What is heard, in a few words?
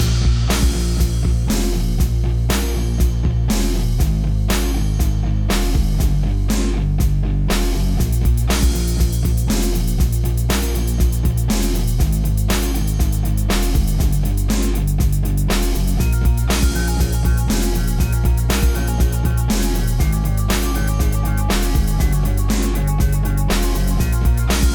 Desert Abyss Theory Boss Loop